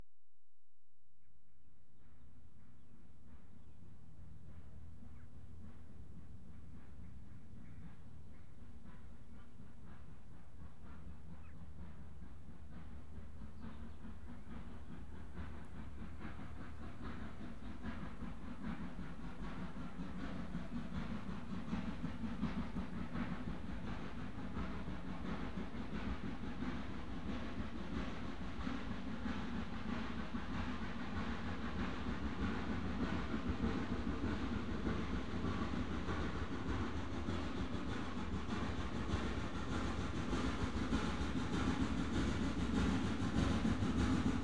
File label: Train Climbs Steep Gradient; BBC Sound Effects Library; Age Of Steam